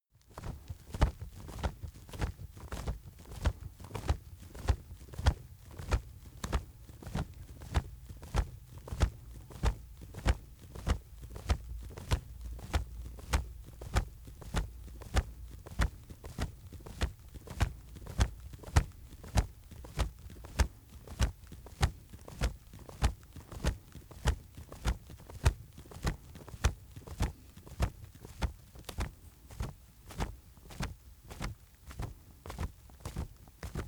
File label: wings flap strong